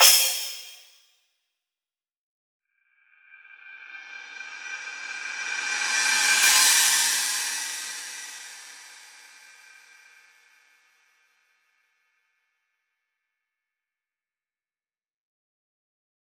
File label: Cymbals; ghosthack